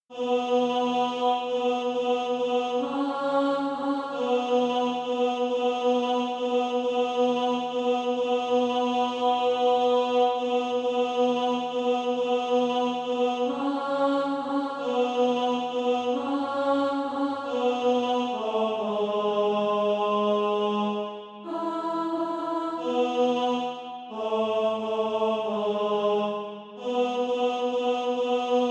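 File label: tenor, SATB Practise Music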